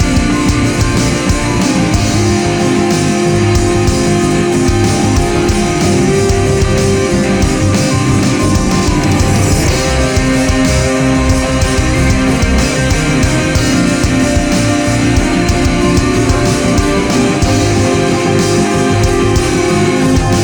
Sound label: Action Hybrid Dramatic Trailer Music